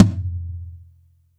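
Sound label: TOM loud; Zero-G; Chemical Beats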